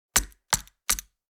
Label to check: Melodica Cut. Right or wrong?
Wrong